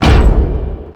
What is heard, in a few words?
walk